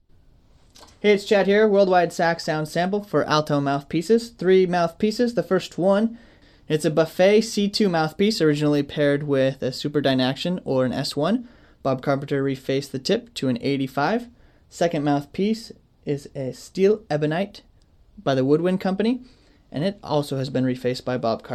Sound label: ALTO Buffet C2, Woodwind80, Woodwind61; mpc